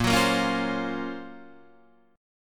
Gb Major Sharp 11th Bb, guitar

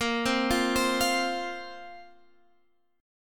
A sharp Suspended 2nd A sharp; 18,15,15,17,18,x; arpeggiated

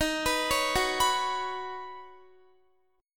D sharp Minor 7th Sharp 5th, Guitar; arpeggiated

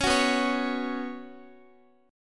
B Suspended 2nd B Guitar; Bsus2; strummed

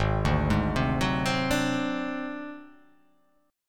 G sharp 7th Flat 5th G sharp down, chord

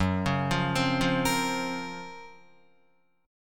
Gb Major Sharp 11th Gb, Guitar; GbM#11 arpeggiated; JGuitar

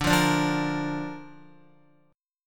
D Minor Sharp 5th, D, down, Guitar; Dm#5 strummed; JGuitar

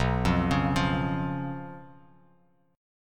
B Suspended 2nd Flat 5th B Bass Standard; arpeggiated; JGuitar

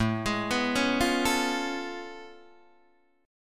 A Augmented Major 9th A down Guitar Open D

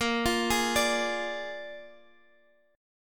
Bb 7th Bb down, chord; Bb7 arpeggiated

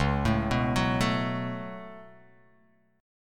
C sharp 7th Suspended 4th Sharp 5th

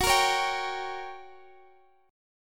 G Minor Major 7th Flat 5th, Gb

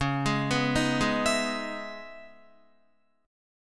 C sharp Minor 9th C sharp Guitar; C#m9 arpeggiated

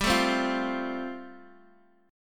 G 7th Suspended 2nd Suspended 4th, Guitar DADGAD

